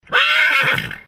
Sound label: horse; Oculus